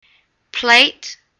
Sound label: plate